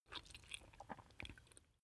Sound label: swallow food